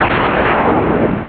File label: Formed Molecule; 300 games for mac; Arcade; Chiral Basics